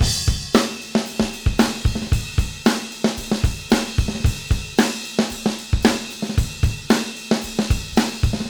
Beat Loops; Zero-G; Monster Beats

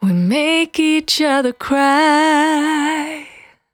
Cry 68bpm B, Vocal; Zero-G

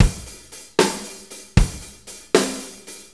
6-8 Loop, Full Kit Loops

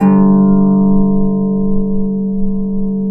G0 HARP; USB Soundscan vol.62; AKAI

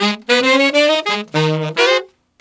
Sax Section in Ab7, Jazz; Traditional; Sonic Foundry (Sony Creative Software); Jazz Solos and Sections

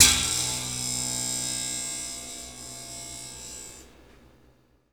SPRING; PERCUSSIVE; Rarefaction; Poke in The Ear With A Sharp Stick

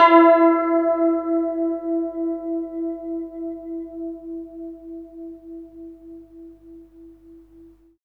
SITUR E4; Spectrasonics; Heart of Asia

